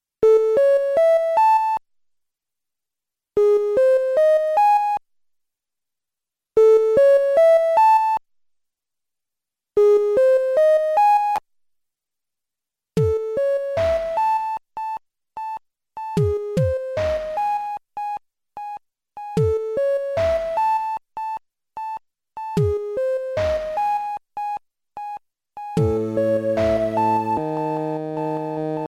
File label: quiet ringtone